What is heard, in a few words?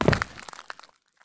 landing glass; Escape from Tarkov